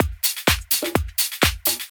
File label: tuned washed house drum loop; drum loops